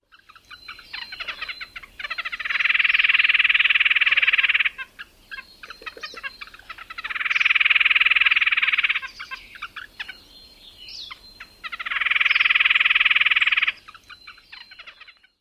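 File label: nasal squeaks & chattery outbursts, Red-breasted Nuthatch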